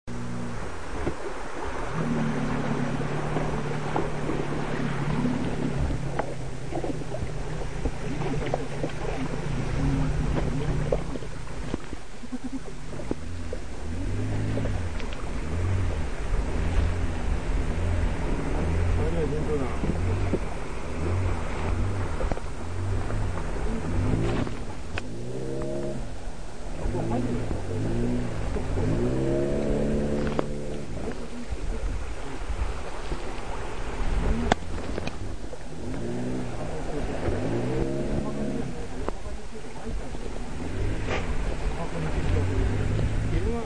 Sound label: river; mulu